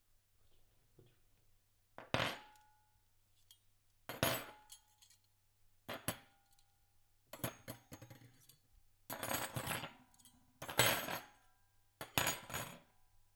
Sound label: Cutlery